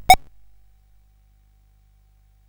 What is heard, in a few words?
sid CSG8580R5; hvsc